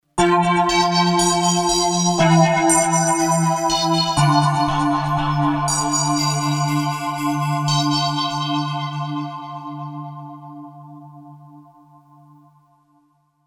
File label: R3 Bells